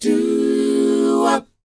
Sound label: DOWOP D#, Vocal R&B DOO WOP; ILIO; Vocal Planet